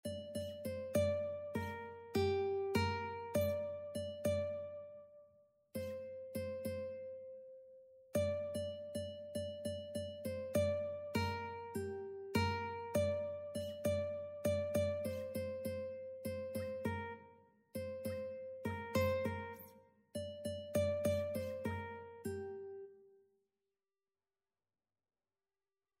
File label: ukulele